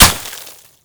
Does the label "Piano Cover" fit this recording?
No